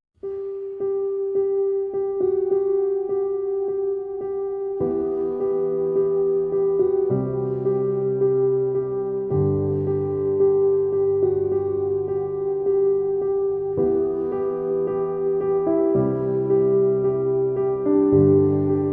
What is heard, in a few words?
piano